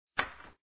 typing; Monster Arena

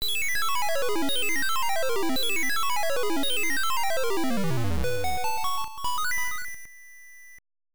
Get Instrument; Legend of Zelda The Link's Awakening 1993 gb

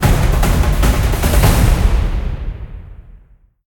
Fight Music End Drums; Sherlock Holmes The Devil's Daughter

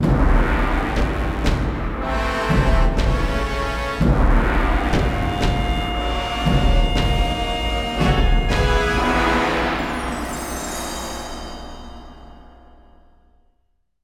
Temple

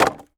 IMPACT Wood Plank On Wood Pile Short mono; Infernal Radiation

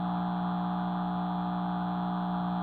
Spot On

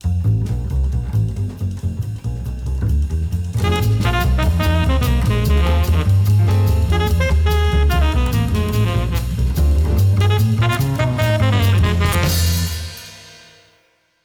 Stinger

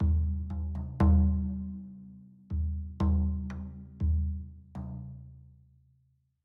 Citadel Music Drums; Frozen Flame; windows

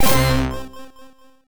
Orchestra Hit

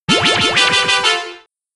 Fanfare- Final Lap; lego racers 2

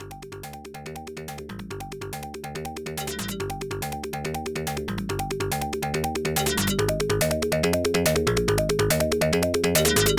In Game; Pac-Man 99; Rolling Thunder

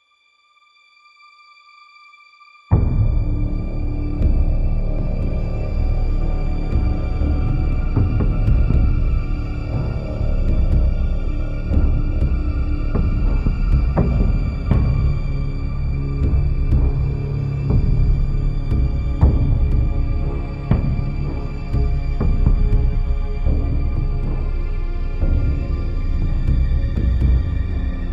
Loop Tense

